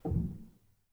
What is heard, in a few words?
rel, Grand Piano; bitKlavier Grand; Piano Bar